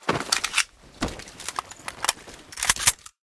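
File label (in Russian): подготовка снайперской винтовки к стрельбе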